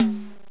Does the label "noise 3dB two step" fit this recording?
No